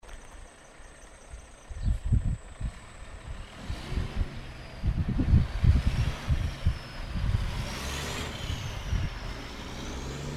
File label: TRUCK; HUDSON